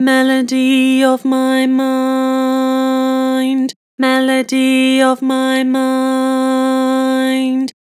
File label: Vibrato, Vocals; Melody of my mind; Harmor Vocals